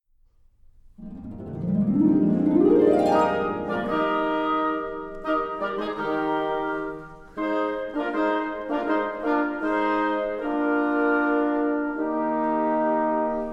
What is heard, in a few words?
TIMPANI; Entree